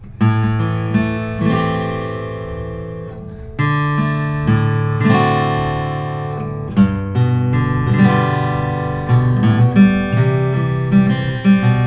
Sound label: martin custom 00 42 12 fret